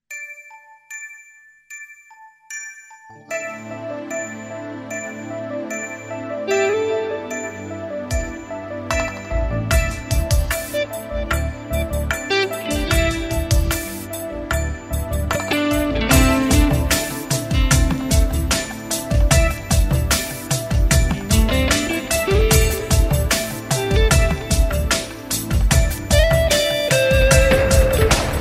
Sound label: melodious music ringtone